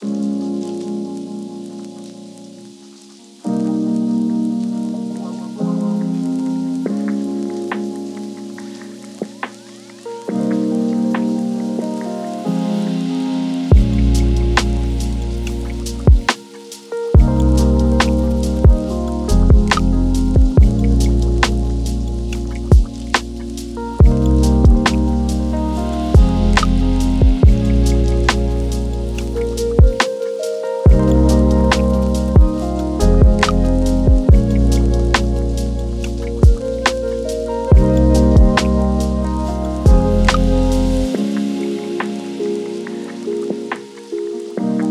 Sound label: lofi hip hop